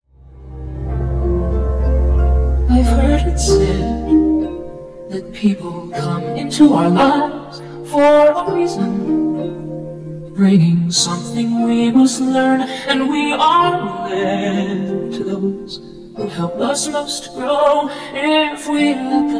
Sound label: For Good Vocal